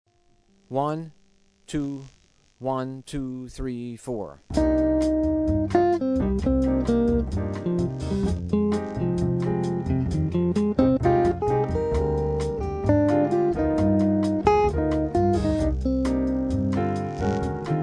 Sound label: Penta Improv